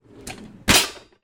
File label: household pots pans drawer open; zapsplat